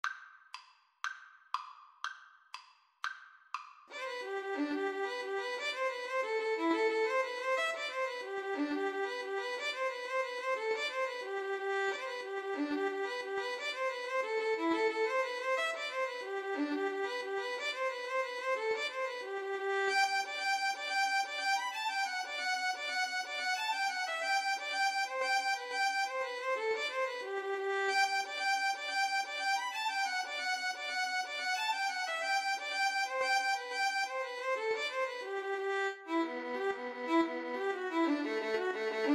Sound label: irish jig medley violin cello duet